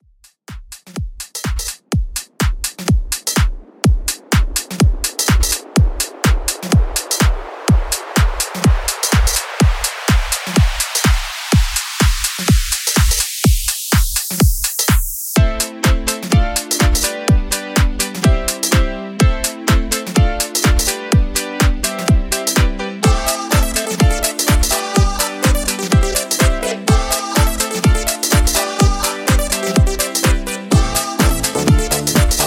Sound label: 90s Redrum Mix 125 Bpm Clean